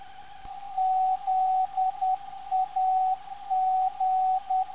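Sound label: ZAG 365kHz, non-directional beacon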